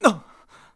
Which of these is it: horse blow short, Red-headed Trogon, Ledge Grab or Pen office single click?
Ledge Grab